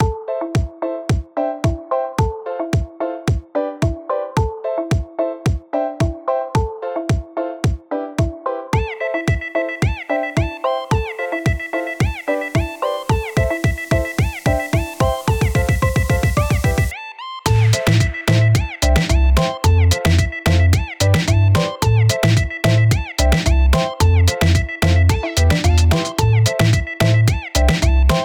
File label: Peafowl Loop